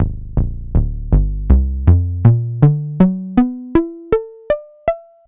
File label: arp